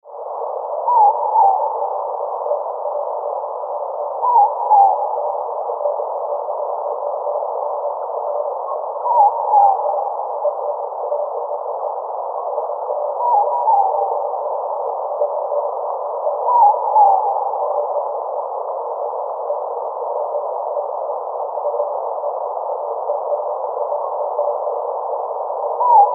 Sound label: morepork; marcot